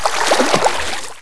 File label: player swim